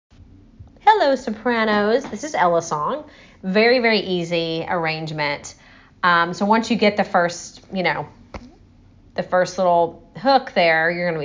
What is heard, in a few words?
Soprano